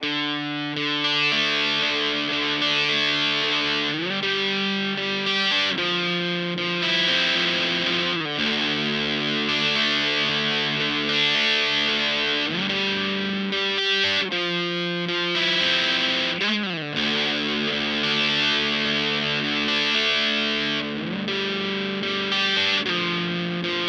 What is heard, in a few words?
Orange Terror Stamp amp